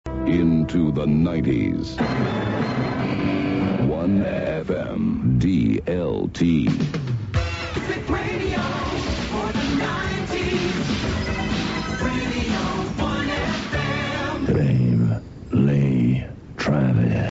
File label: jingle mix